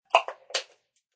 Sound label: Close Aerosol Top, Closing plastic top of aerosol can; Spraying; Objects